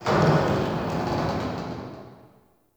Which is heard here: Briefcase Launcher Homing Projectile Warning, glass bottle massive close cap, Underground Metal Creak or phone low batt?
Underground Metal Creak